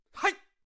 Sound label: Taekwondo Attack Vocals